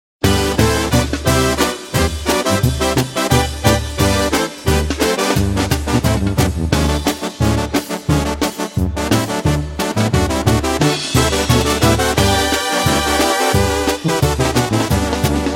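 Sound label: instrumental; Latin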